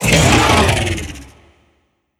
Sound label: beast vocal angry growl